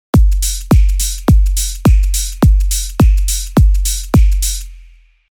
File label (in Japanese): トロピカルハウス, ドンチータッチー＋シェイカーがシャッカシャッカシャッカシャッカです。; EDM; スイング設定50～70%程度の、軽くハネ気味のリズム; 2・4でスナップを鳴らす; ハイハット・オープンは裏打ち; シェイカーを16分で刻む; ハイハット・クローズの代わりに、シェイカーを16分で刻むことも多い